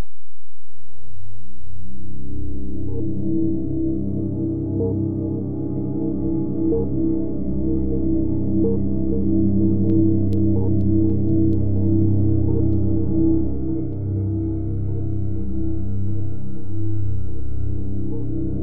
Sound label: ambience; OneShot Fading Memory